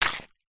impacts plastic clock small hit smash with hard object